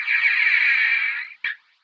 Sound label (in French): CHOUETTE